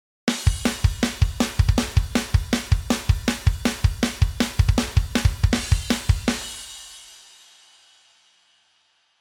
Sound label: drum off